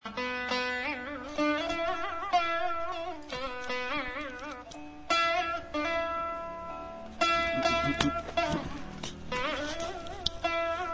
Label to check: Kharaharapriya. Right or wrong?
Right